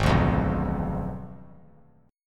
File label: G sharp Diminished 7th; strummed; 8,9,8,9; 3C,3F,3A,3D